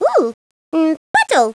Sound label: puddle; Play with the Teletubbies; PS1, Windows